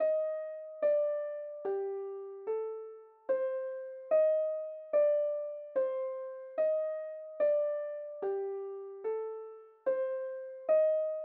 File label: 146 BPM G Min Topline Piano, Drill; Melody; Loop Stems; Cymatics; 2022 Melody Collection - Drill; Meet The Reaper